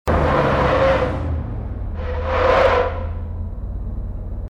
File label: throttle off; TDMCarpack; TDMCars